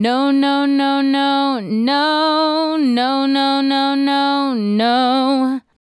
Female Solo, Hip-Hop and RnB Vocals

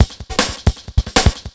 Beat, Futurist Drum & Bass; Drums & Percussion

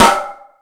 House Machine, Drum Machines

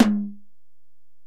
Tom, Alesis DM-PRO, Drum Machines, Toms

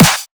drum snare knusper; Virtual Riot; Drum Hits

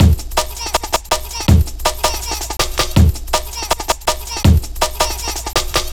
Jam 162, Drum Loops, Jungle; Jungle Warfare 1&2

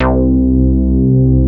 C3, BASS; Q Up Arts; Dream Experience; BASS BANK 11

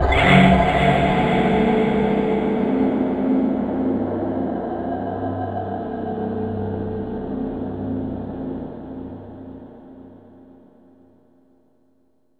Scary, nightmares; Dream Zone

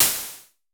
SN Basic, Vermona Mono15 Drums Snare

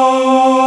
DIGI WAVE, DIGI PAD

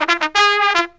TRUMP, Killer Brass Riffs; USB Soundscan vol.29 - Killer Brass Riffs; AKAI

